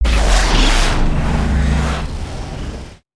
Tornado Start

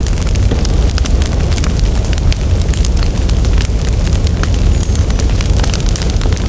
battle torch, ambience; Nimbus

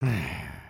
Sleep; creatures organic

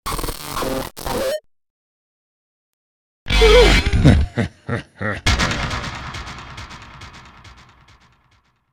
dark reveal laugh face flashe

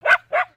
Pet Dog Small Happy; creatures pets; Lego

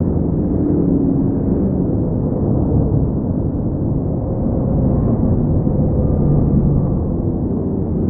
Race Loop

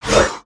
Swing; Valiant; Sorcerer; aronwk collection; ndaudio

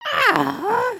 raincloud

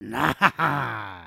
Walk, creatures organic

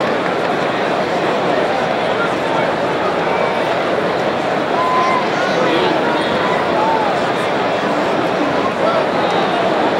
Small Audience Idle

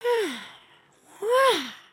ninja build forward, emotes

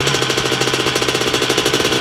moto MED, vehicles; aronwk collection; ndaudio

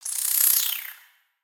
Spider Idle; Lego; creatures mechanical

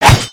Sword Slash; aronwk collection; ndaudio

